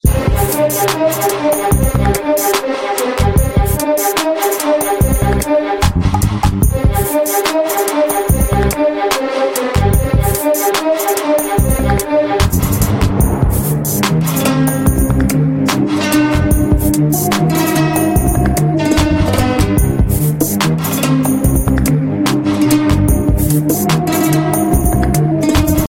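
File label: suspense tone ringtone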